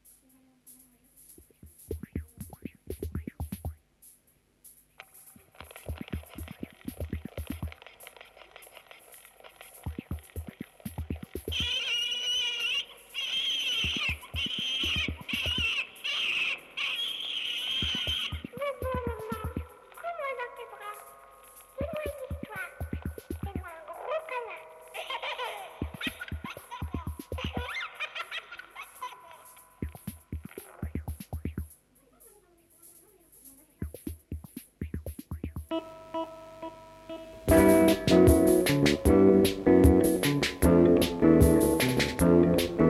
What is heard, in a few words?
Staccato